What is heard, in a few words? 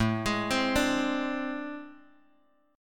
A Minor Major 11th, Bass; arpeggiated; JGuitar